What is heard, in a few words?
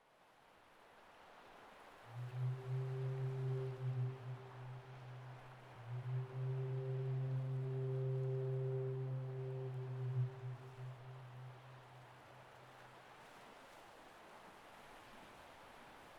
ship horn; Minecraft; liminal-industries; Liminal Resources